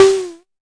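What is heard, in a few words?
mbrute percussion, Arturia MiniBrute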